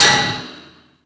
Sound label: pipe hit; projectiles; bullethit; Max Payne